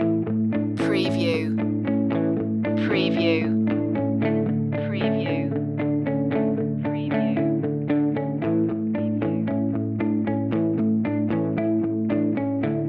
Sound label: advertise this loop, Corporate Motivational